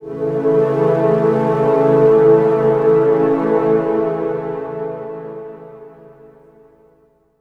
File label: Orchestralic, Pads; Sonic Foundry (Sony Creative Software); Modular Electronica